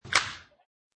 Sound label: Baseball Wood Hit; Baseball Sound Effects; Sound Effects:Sports